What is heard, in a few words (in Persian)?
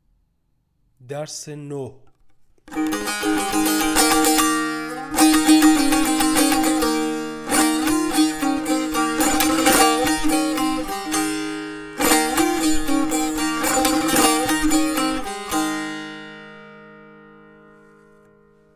مشق تنبور